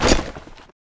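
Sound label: shield push; Counter Strike Model Pack; CS:GO; survival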